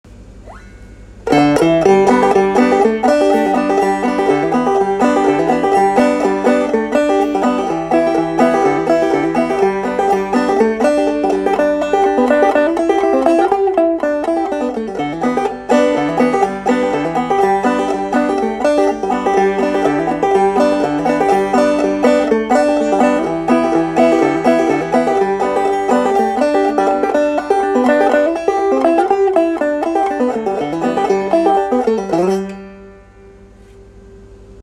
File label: Tubaphone melody